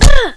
bhit flesh; nst player

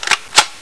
blaser clip in, weapons

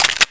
uzi slideback

